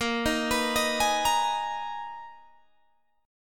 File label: B Major 13th Bb, guitar